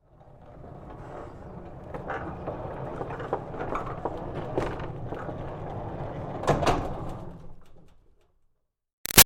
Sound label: Door Barn Rolling Long Roll Slow; Barn Doors